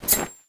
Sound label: Teddy Knife; MiSide